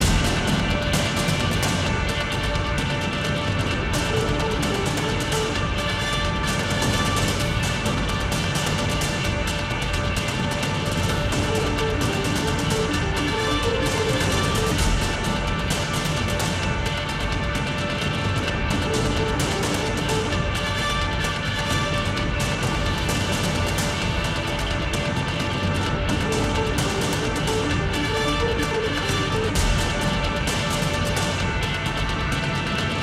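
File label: Music Bunker Battle Loop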